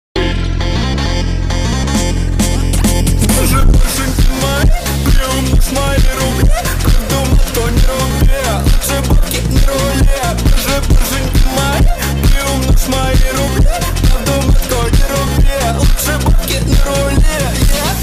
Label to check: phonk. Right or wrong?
Right